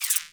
Scope Gun Zoom Out; Warframe; Weapons; Corpus

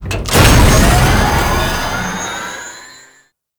Elevator Speed Up; Warframe; Jade Shadows; Ascension

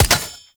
Fishing Spear Damage Hit; Weapons; Venus Fishing Spears; Warframe

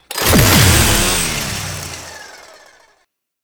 Chain Saw Tonfa Stack Buff; Warframe; Grineer

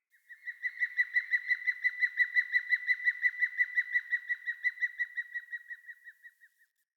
Night Creature Medium; Night One Offs; Ambience

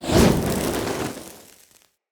Torch Whoosh; Weapons; Enemy; Torches; UC Torch

